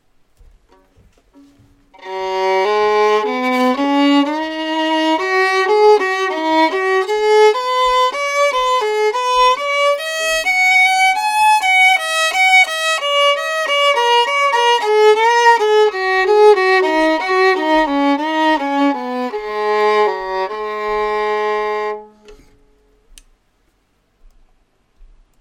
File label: arioso new bass bar workshop strings